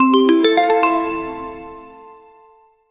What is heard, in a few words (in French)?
son de victoire simple; victory